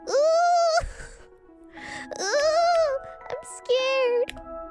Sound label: uuuuuuu; noises